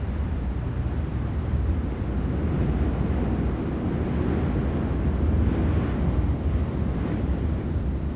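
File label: ambience shaft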